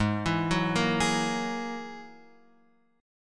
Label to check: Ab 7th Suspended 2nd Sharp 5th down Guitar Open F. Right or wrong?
Right